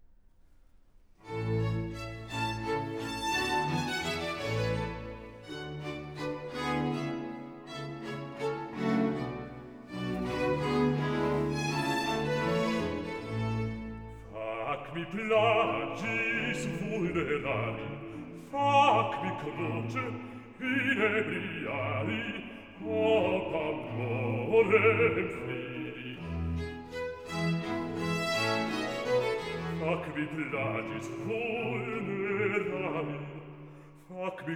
Solo Basso; d'Astorga - Stabat Mater - Fac me plagis; DHM 50th Anniversary Edition

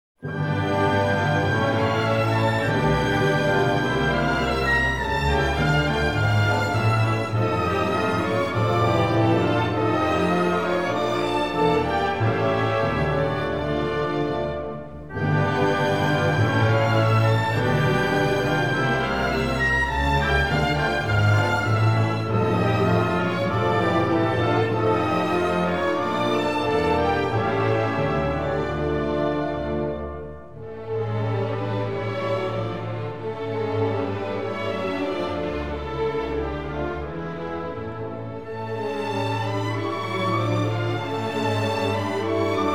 Hungarian Dance in D minor, Symphony Orchestra; Brahms Hungarian Dances; Istvan Bogar; Budapest Symphony Orchestra

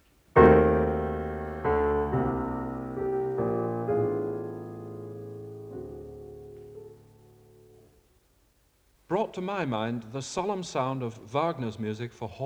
Music Example (Piano)

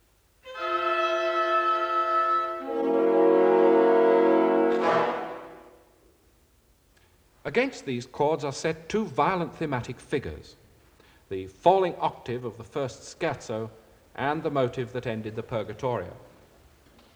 Music Example (Orchestra)

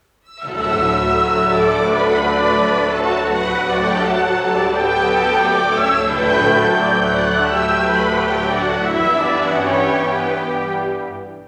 Music Example (Orchestra); Deryck Cooke; Illustrated talk, Symphony No.10

